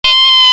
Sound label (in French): moustique niveau 2